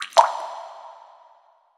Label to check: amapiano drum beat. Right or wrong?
Wrong